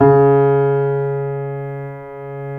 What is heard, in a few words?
C7; East West; The Ultimate Piano Collection